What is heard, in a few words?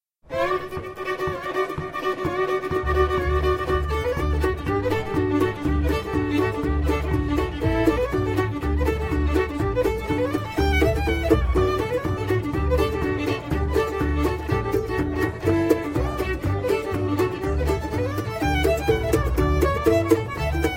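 fiddle; Fishers Hornpipe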